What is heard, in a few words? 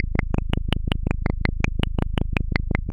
Noise, LFO & Noise